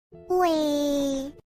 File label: uweee; vestia